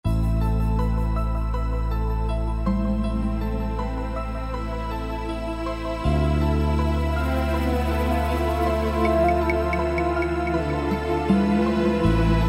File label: Yamaha PSR-3000 Factory Styles Movie & Show; SecretService